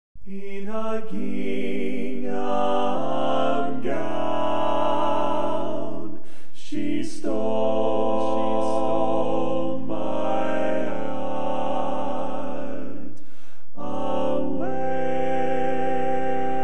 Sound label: bass